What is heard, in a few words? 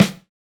Snare; Reference beat bpm95; urban grooves; Rock